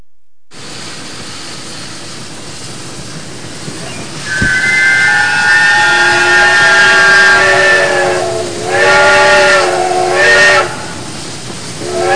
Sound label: steam; Sound Effects Library 1.5